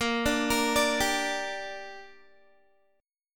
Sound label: Bb 6th Bb, Guitar; Bb6 arpeggiated; 18,17,20,19,19,x